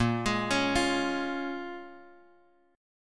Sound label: Bb Minor 7th, Guitar, Drop D; 8,11,11,10,x,x